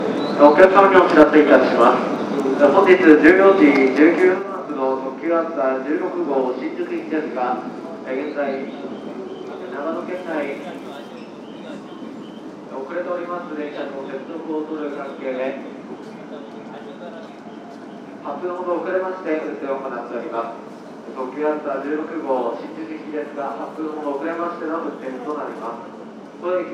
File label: Japanese Train Platform